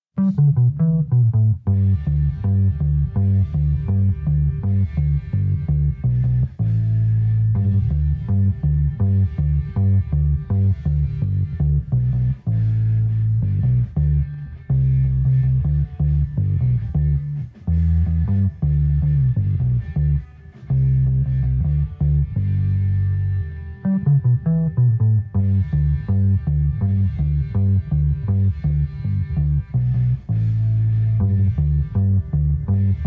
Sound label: rhythm